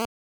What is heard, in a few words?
click; SDL minesweeper